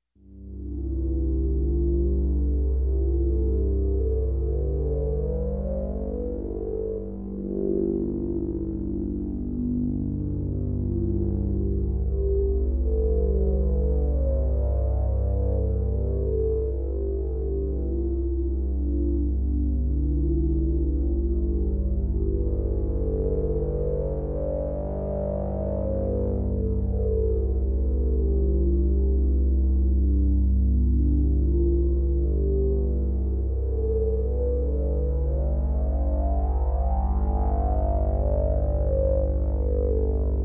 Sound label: Dark Harmonic Sweep Pad